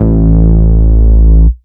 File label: Bass Root A; Vengeance Samples; Vengeance Ultimate Bass